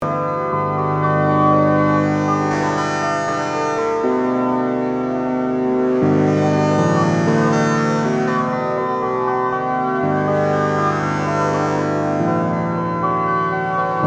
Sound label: STRINGS Variations